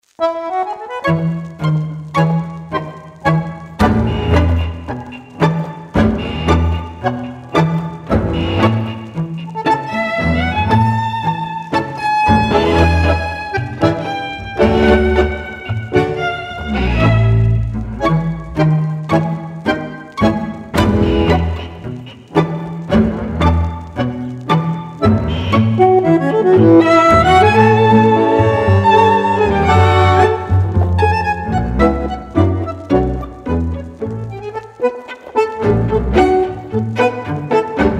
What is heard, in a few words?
tango Music aged